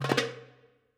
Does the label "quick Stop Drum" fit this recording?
Yes